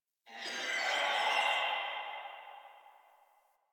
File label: Tree Distant Critters Happy Calm; Ambience; Warframe; Duviri